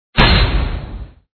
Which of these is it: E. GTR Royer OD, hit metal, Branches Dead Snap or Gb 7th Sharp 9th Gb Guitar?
hit metal